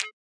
nasty stormfront; Nasty Stormfront Electro FX

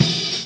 kick hats; Punches Hits Discoblasts

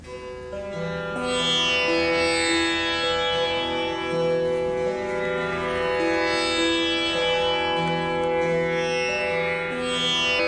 darbari kanada xfade, Far East Drones and Plucks

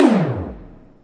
giant electro tom

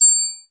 triangle

